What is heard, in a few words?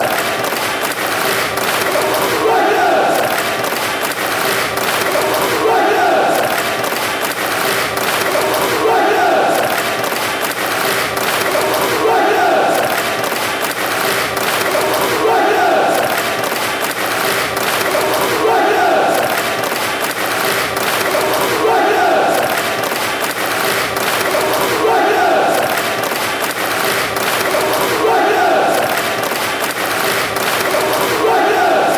clap, chants; rangers